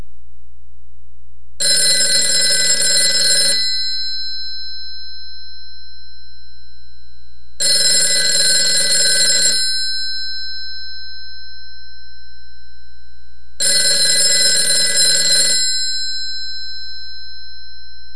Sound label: North Electric 7H6 ringer